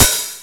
cymbal, drums

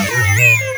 grill

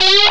bite

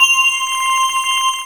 SciFi Bell

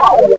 flyer

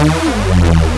caveman

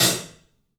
3dphat

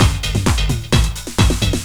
drum loop

